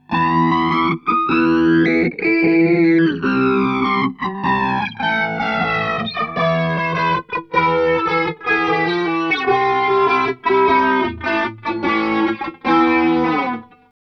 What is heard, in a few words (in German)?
mit Verzerrer; EHX WOTM